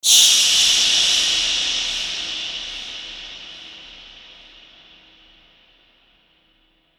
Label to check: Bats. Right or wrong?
Right